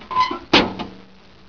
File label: mtl gate